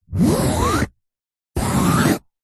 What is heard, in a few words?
foley fabric pointed object scrapes fabric over hard surface whizzing sound; zapsplat